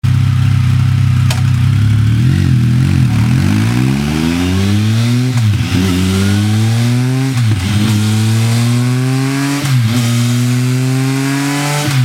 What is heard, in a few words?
TRIUMPH SPEED TRIPLE; GP DOPPIO